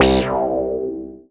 Dance Mega Synth; Best Service; 90 s Sample CDs

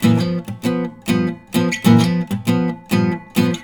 riffs, Blues Guitar; ILIO; Fretworks

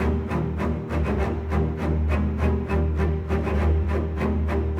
Bass Cello, Orchestral; Sonic Foundry (Sony Creative Software); Orchestral VOL-2; Modern 06

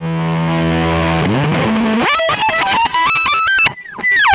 backward, Psychedelic Guitar; Loop Samples

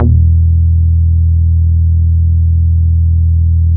WORK BASS, Bass Analog & Synth; USB Soundscan vol.12; AKAI

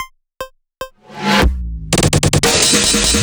Feel some Amen in BPM148

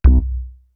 MOD BASS; East West - Global Sounds; PROC.BASS

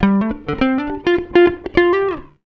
104 FUNKY, Electric & Acoustic Guitar Loops

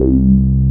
A BASS; CMP - Analogon

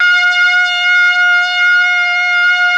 BRASS; ILIO; Propeller Island Legendary M400

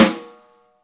snare, Roland

